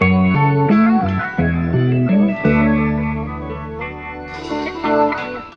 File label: guitar angry, Jazzmatazz loops